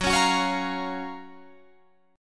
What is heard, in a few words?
F sharp Major 7th, Guitar, Drop D